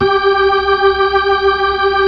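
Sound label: B-3, Hammond B-3 organ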